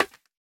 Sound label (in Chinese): 竹放置声